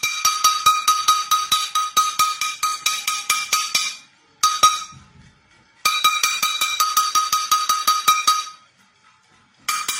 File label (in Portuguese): cachorro batendo na panela